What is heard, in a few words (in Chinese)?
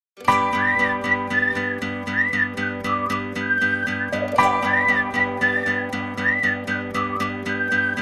口哨